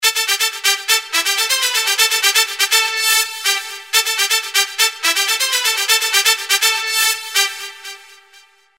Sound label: trumpet